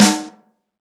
00s long tail reverb steel snare drum sound g sharp key